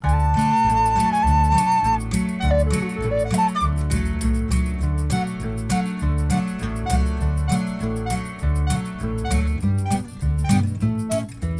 Le chamois roux Instrumentale

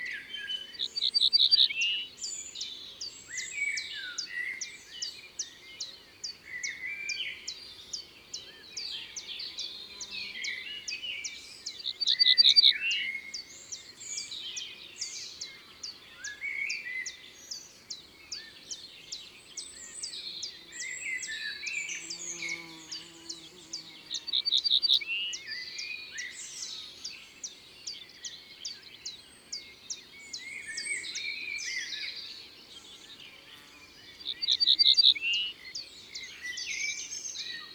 Bruant ortolan; Drome; Concerts Naturels de la Drome; Studio les Trois Becs

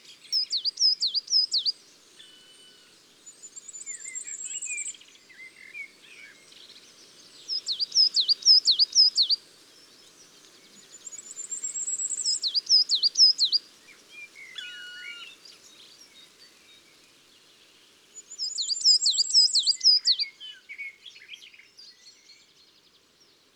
Mesange noire, Chants d'oiseaux des bois et des forets

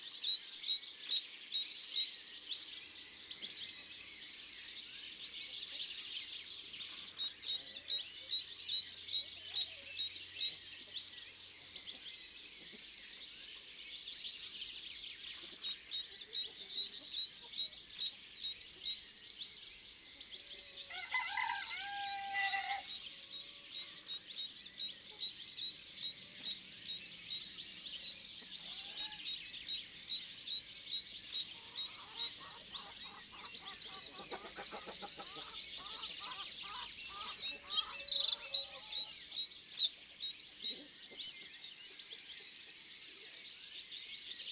Mesange bleue Chants d'oiseaux dans la campagne

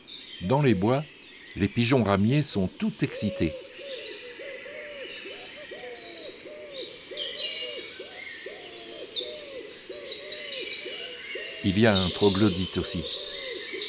Le Pigeon ramier; chants d'oiseaux

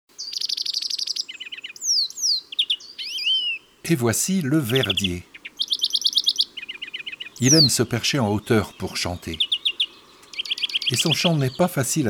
Le verdier

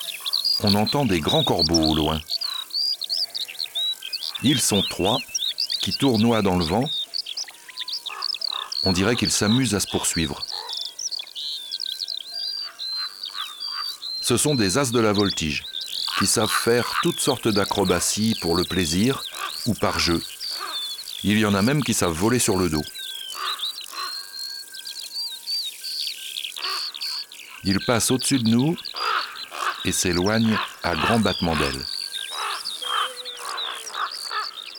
Grand corbeau; abc de la nature

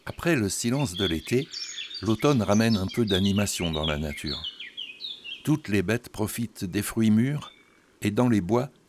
Automne, chants d'oiseaux

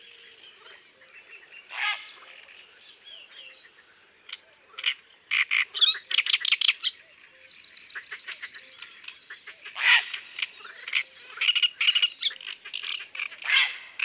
Heron cendre; Isere; Concerts naturels de l'Isere; Studio Les Trois Becs

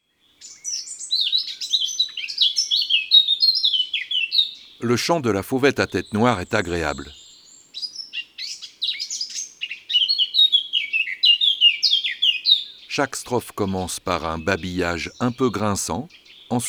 Fauvette a tete noire; oiseaux des jardins

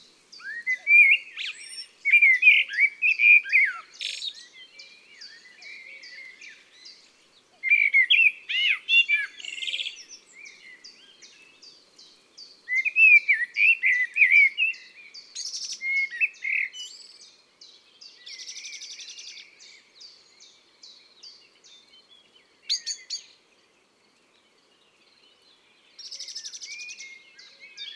Merle noir, Chants d oiseaux des bois et des forets

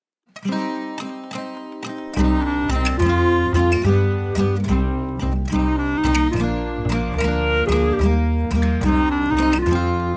Le geai coquin version instrumentale

